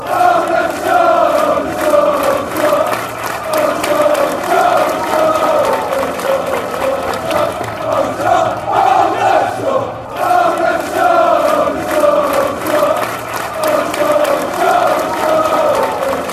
aldershot aldershot aldershot, chants

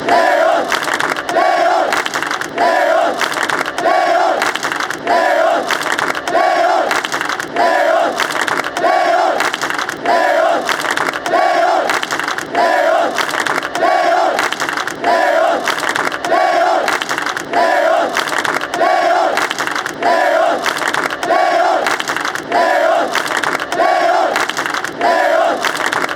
leon claps

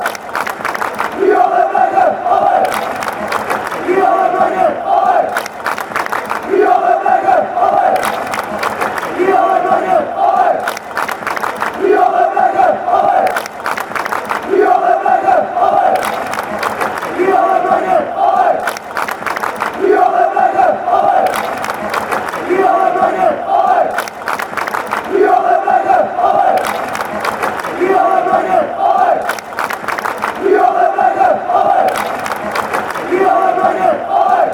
ole ole, chants; braga

